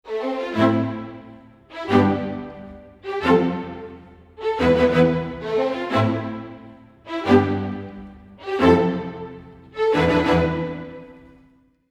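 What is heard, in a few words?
90 A minor strings, Orchestral arrangements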